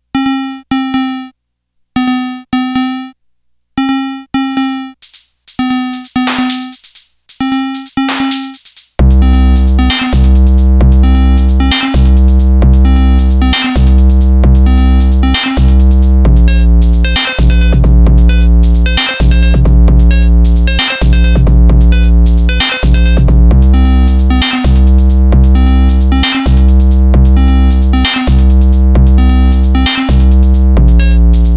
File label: tribal noise; weeklybeats-2014